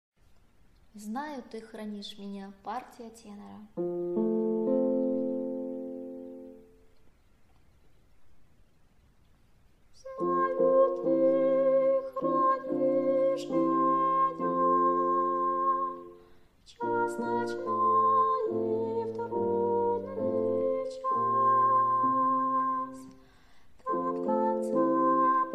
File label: tenor